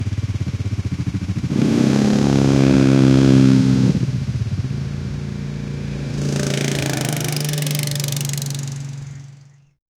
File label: motorcycle single bike